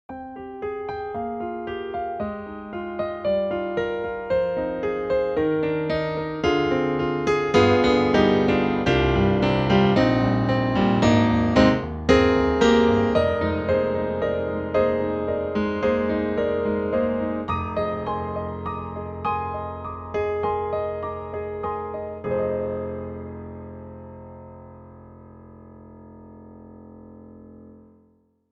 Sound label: CFX CONCERT GRAND; GARRITAN; Mad Hatter